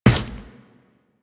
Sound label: Run Default, Footsteps; Warframe; Tenno